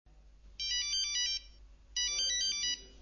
sonnerie tel

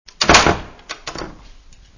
texture ferme porte

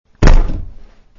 texture eclate

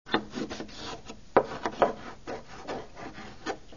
texture grattement

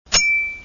battement rire

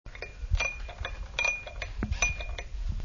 texture cuillere cafe remue

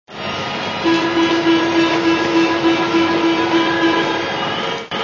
texture metro bip

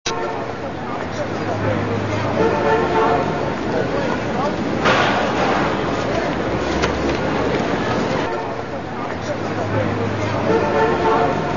ambiance ville rue klaxon

